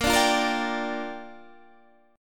Bb 6th Bb; strummed; 18,17,15,15,15,15

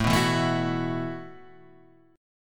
B 7th Suspended 4th A

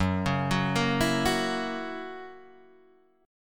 Gb 7th Gb down Guitar Standard, chord; arpeggiated; JGuitar

